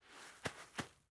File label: sand impacts